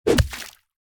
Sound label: punch